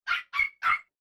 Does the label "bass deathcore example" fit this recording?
No